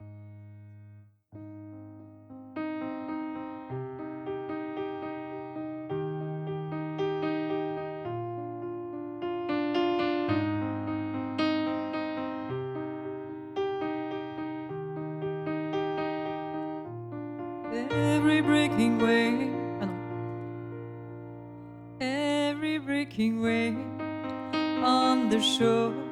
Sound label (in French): Duo Piano Voix